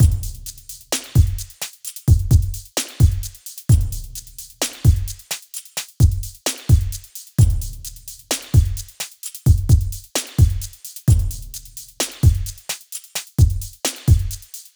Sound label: 130 Drums Full